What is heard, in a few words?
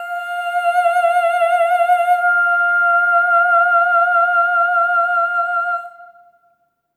EH OH F5, SOP; Spectrasonics; Symphony of Voices